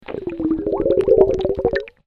bubbles

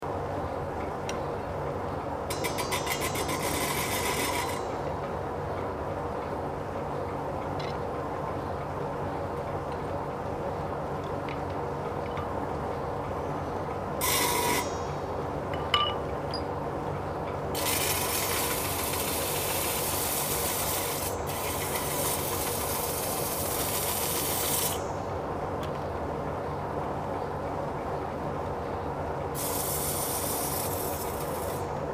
foundry 1800s metal turning

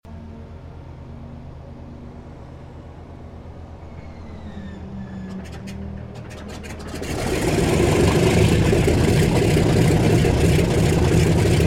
aircraft douglas dc3 startup idle; 1945

